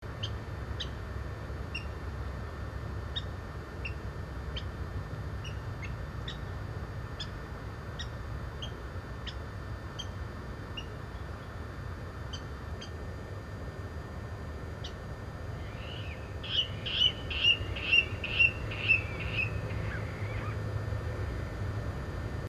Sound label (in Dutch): Waterrallen